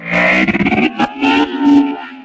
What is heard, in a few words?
transforming robot false start